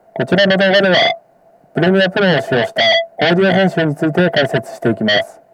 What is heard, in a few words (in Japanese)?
ギタースイート放射線トラック適用